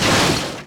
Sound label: crush, Impact